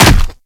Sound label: Infested Ancient Step Default